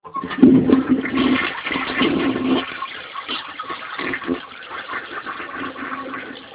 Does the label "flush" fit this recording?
Yes